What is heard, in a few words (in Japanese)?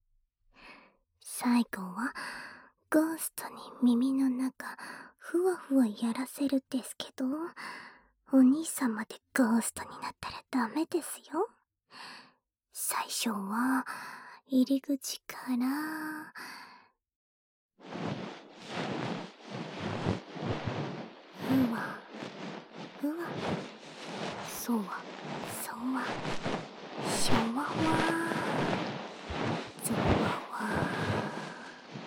【左】梵天(終), 耳かき, ASMR